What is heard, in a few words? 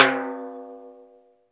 TIMBALES2, PERCUSSION; Crypton - DrumBase5000